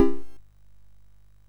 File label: sid MOS6581R3; hvsc